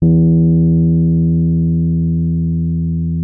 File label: E2, Rhodes; Xsample VOL-1